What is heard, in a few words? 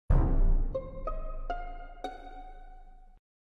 dead body reported; among us